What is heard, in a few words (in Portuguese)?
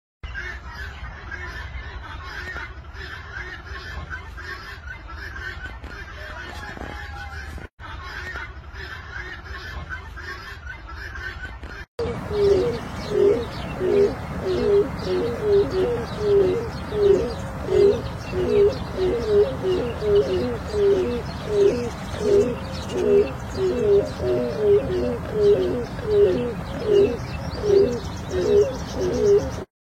canto do grou coroado preto